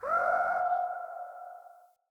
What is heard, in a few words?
Day Distant Bird, Ambience